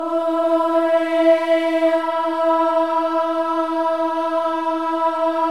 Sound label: OH EH, Symphony of Voices; Spectrasonics